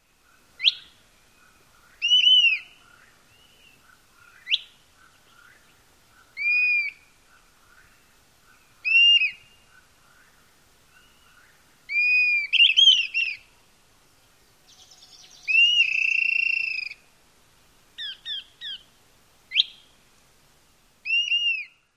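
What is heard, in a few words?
song, Dusky-capped Flycatcher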